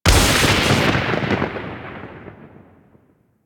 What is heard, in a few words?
Lightning Impact; Enemies; Abilities; Warframe; Teralyst; Rainalyst